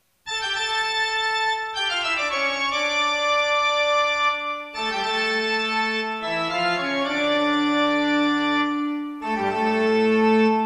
toccata

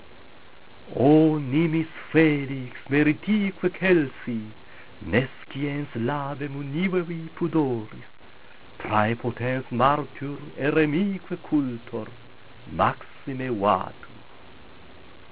Utqueant, latin

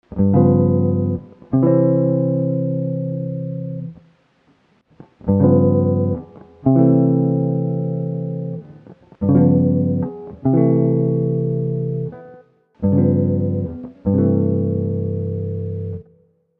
S Guitar

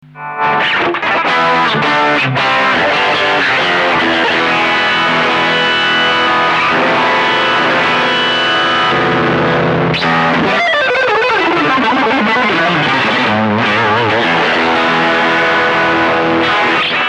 Marshall, CLEAN DRIVE; The Guv'nor; GAIN9 BASS5 MID6; TREBLE8 LEVEL5; これは適当なアンプに繋ぐことにより、マーシャルっぽい音を出せる。

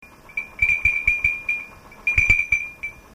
event assembly bell

